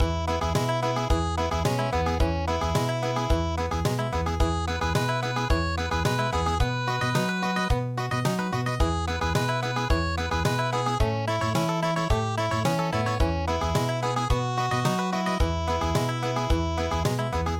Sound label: country